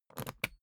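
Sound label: briefcase plastic latch lock key pull out quick, Büroarbeit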